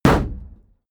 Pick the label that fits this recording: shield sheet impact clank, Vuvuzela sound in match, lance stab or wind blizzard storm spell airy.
shield sheet impact clank